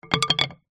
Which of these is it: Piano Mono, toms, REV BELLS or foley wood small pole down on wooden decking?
foley wood small pole down on wooden decking